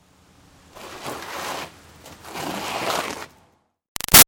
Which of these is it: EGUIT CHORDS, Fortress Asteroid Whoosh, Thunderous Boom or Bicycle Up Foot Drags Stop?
Bicycle Up Foot Drags Stop